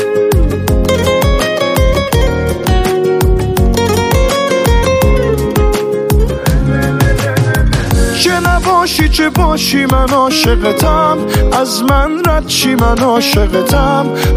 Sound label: Honeymoon Ringtone